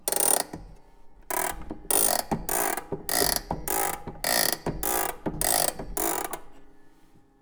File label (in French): MANIVELLE